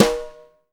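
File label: jazz drum snare drum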